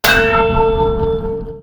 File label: judgement hit; weapons